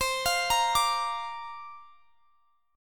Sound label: C 9th Suspended 4th C down